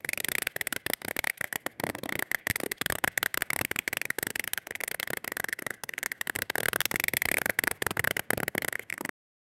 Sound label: cartoon accidet